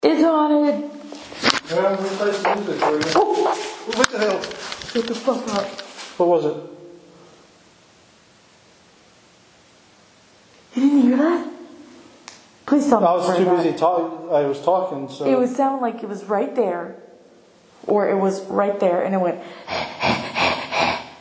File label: 2nd floor breath that was heard